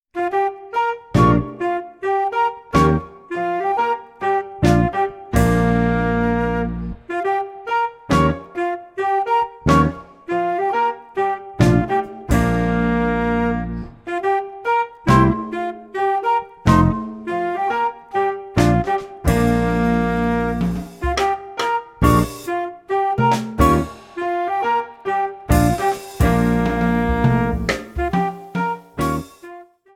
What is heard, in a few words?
Blues, Jazz Gitarre; Jazz Club